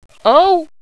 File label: hostage pain; cstrike